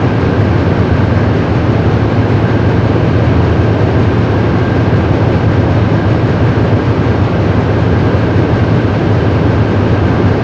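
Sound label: ambience industrial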